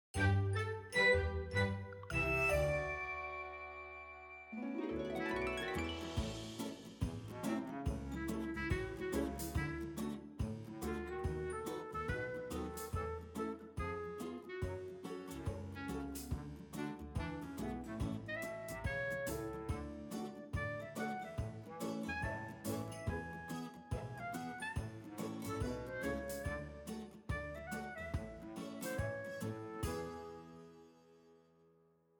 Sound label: Snow Theme Bgm; My Little Pony Magic Princess